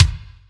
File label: tong